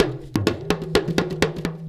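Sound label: big tama groove; Best Service; World Colours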